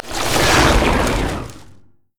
bramble zone splash; Zyra

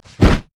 basic attack; karthus; lich

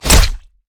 pounce; nidalee base

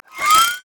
gatling gun; LoL; corki

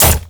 basicattack; LoL; irelia

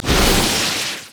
thunder claws; LoL; volibear

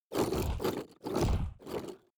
ancient golem angry; LoL; monsters

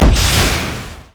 r booster activate; LoL; jinx base

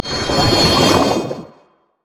surging tides; LoL; nami

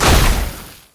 flame breath; LoL; shyvana

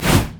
lightsaber missile; riven

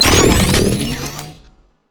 mystic shot activa; LoL; cyberezreal

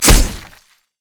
takedown attack; nidalee base

